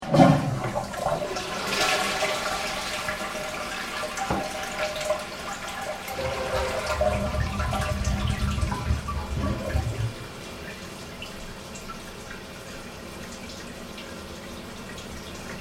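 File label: Toilet Flush Domestic - Flushing and filling; Bathroom; Tom Hutchings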